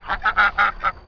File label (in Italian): uccelli birds, animali